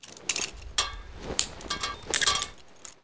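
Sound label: handcuffs; pouta; Mafie 1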